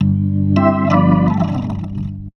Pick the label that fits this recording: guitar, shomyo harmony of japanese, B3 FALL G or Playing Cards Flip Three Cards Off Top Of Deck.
B3 FALL G